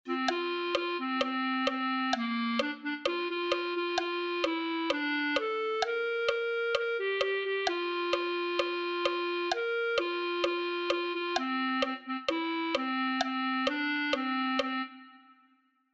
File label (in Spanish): clarinete